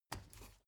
step ladder wood single

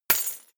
key ring large drop parquet hard; objekte; schluessel

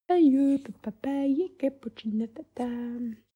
woman sing ethnic

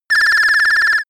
ringing small laser beam; krieg-waffen; soundpool

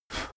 blow

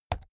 keyboard laptop key press fast hard

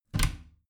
bathroom cabinet both close soft

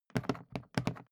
briefcase plastic handle handling quick; industrie wirtschaft; bueroarbeit

